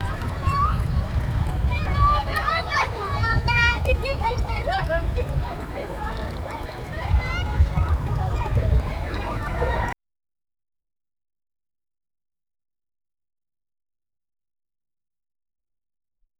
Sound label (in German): ruhiger sonniger tag im, park